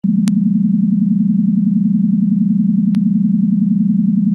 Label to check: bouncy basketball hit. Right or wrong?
Wrong